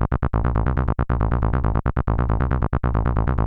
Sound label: fast 404, techno 138 bpm; Best Service ProSamples vol.54; AKAI